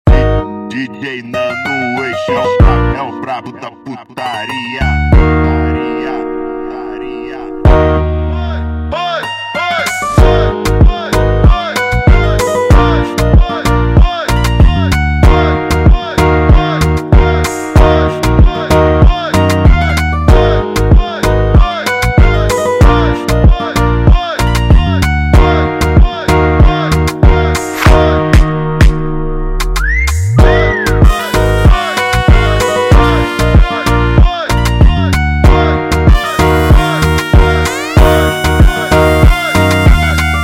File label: Ritmo Da DanÇa instrumental